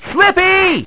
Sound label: slip